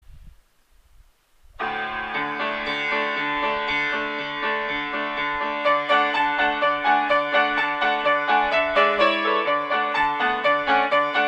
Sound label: rumanian folk, piano